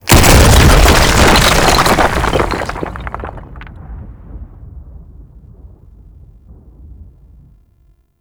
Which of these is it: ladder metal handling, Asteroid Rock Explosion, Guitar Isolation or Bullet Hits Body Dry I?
Asteroid Rock Explosion